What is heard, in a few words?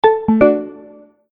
Folder Closed; business scheme beta 2